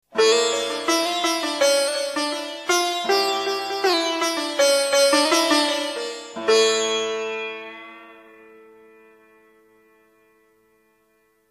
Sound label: sitar